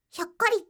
hyokkori

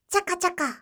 chaka chaka

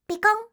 pikonn

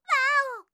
"waao"; hidan

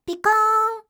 pikoonn

